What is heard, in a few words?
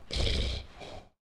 victory snore; Smite; Cerberus